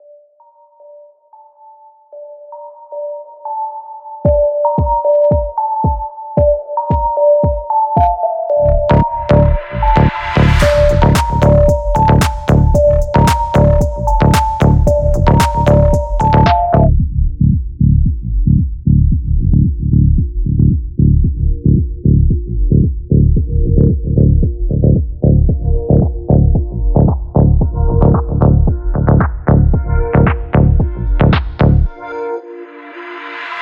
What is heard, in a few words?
Drum bass